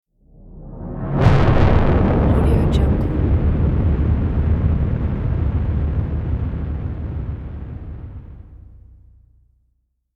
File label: explosions; jtc music